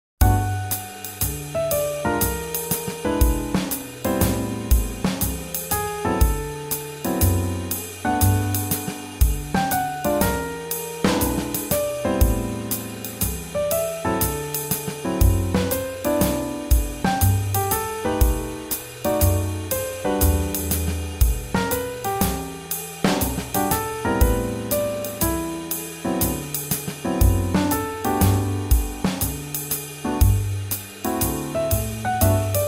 Swing Jazz

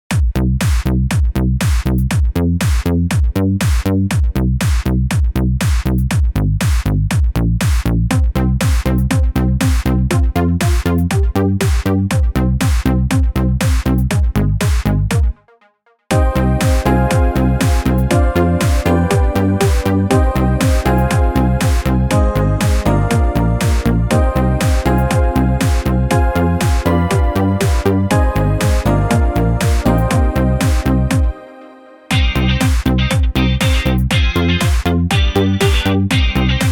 European Electronic, EDM 리듬

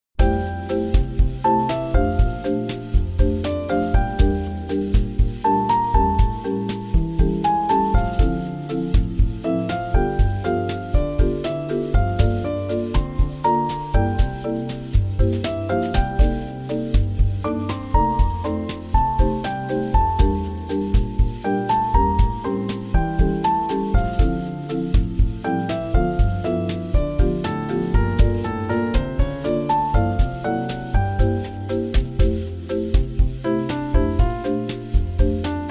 Bossanova

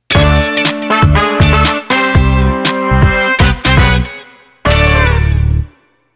Bumper

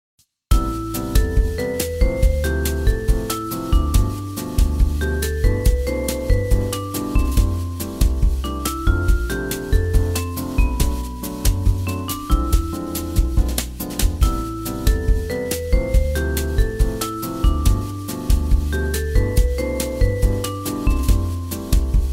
Bossanova

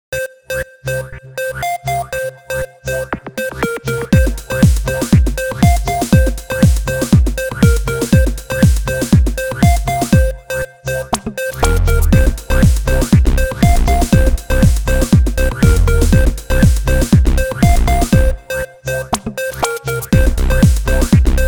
European Techno House

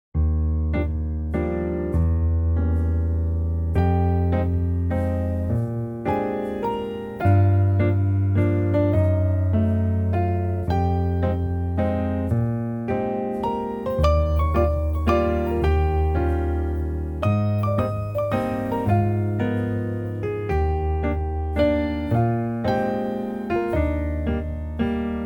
Duet

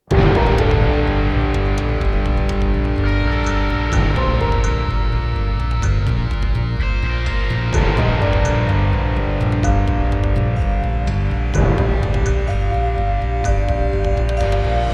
Snitched, Tension

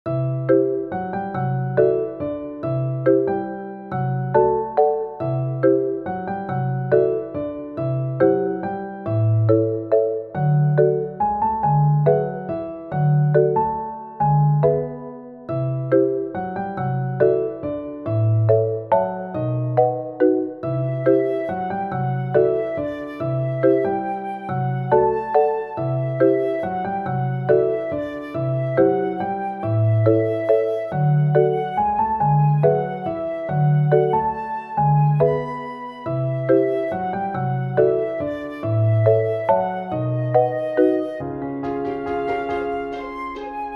Orchestration, 자연다큐

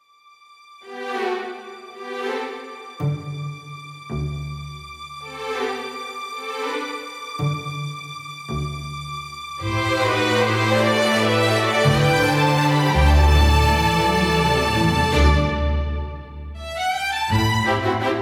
Marching Waltz; Grand 희망적

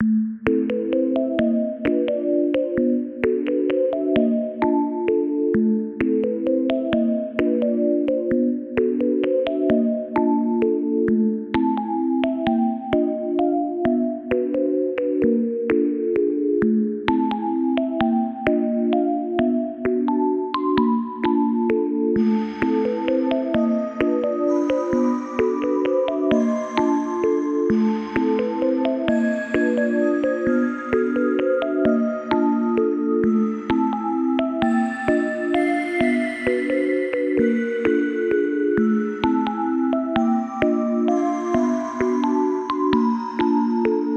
Retro Horror Game, Tension 공포

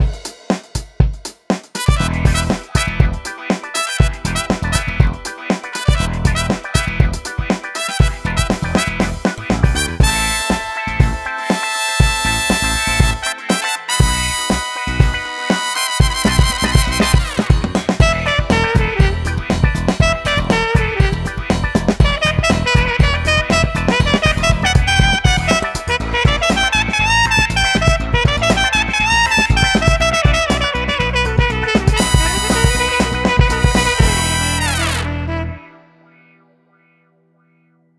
Start Trumpet; 경쾌

